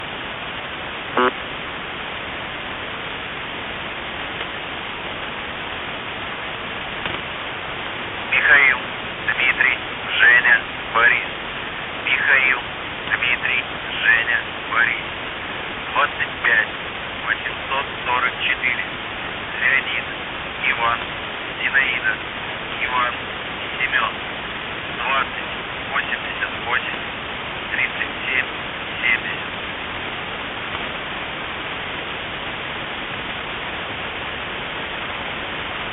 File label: buzzer